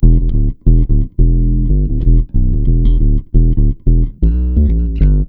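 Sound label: E minor, Bass, 90 BPM; Sampleheads; Ultra Freakin' Bass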